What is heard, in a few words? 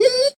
Wolf Hit; The Battle for Wesnoth